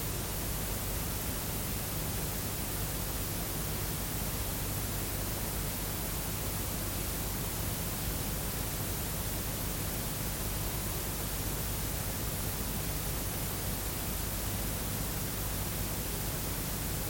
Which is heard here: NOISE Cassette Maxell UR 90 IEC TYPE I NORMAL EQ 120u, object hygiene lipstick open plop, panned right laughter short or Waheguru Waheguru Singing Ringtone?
NOISE Cassette Maxell UR 90 IEC TYPE I NORMAL EQ 120u